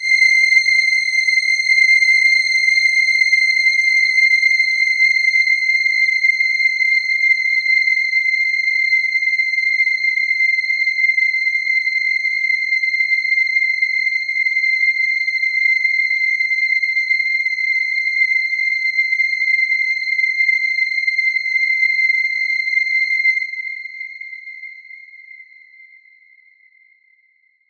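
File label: synth tera string c; M8; M8JUNGLEPAK1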